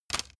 cd in cover fast

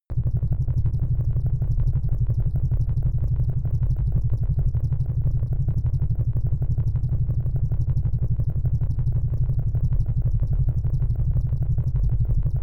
space idle running machine

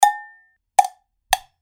cowbell

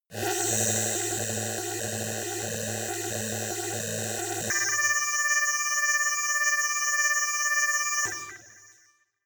scanner

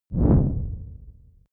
whoosh mid distorted

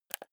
console controller joystick press fast

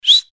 whistle

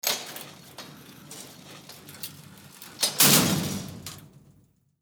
elevator old style

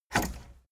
cassette eject push

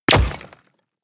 bullet large impact wood

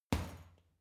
boxing punching bag uppercut soft

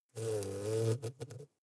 hornet hum hard

